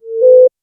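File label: soft calming computer wake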